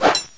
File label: knife hit; wanted; weapons